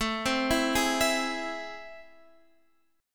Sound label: A Minor Major 7th, down, Guitar, Drop D